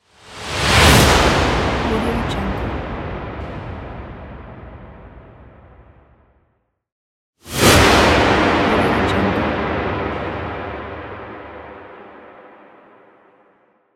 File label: big swoosh